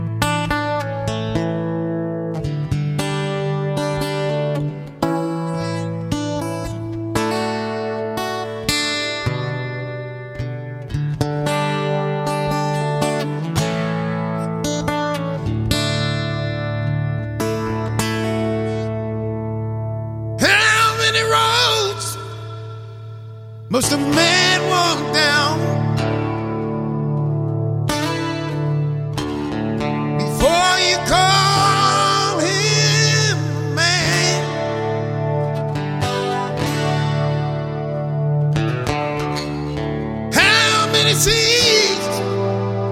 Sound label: acoustic